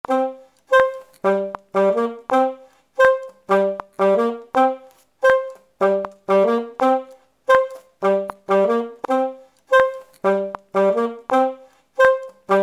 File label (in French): Basse